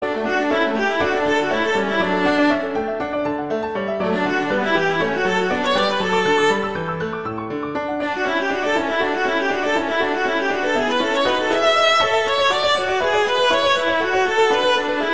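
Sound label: Toccata, Viola Piano; P.D. Paradisi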